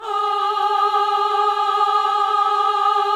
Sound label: VIB F G#4 -L, Symphony of Voices; Spectrasonics